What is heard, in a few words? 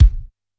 Bass Drum Soft, Standard Kit; Yamaha PSR-E323